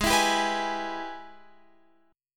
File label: G sharp Minor Major 7th Sharp 5th G sharp down Guitar Open F; G#mM7#5 strummed